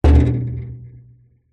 impacts metal trailer side hit knock

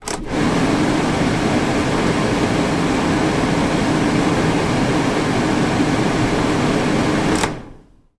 van fan turn on full then off mercedes sprinter

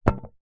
cd disc drop carpet; household

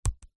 sat nav touch screen finger single tap on screen

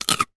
human bite carrot

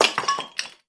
impact rock rubble; sound effects one

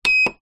sport gym vibration machine button press beep

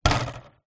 table soccer game bar spin

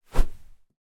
sport cricket bat swing

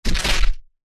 impact body hit hard crack

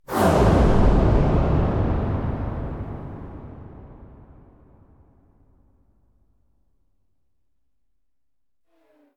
Transition Slash; bottlerocket

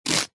celery raw cut knife single

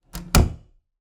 Door Bedroom Close

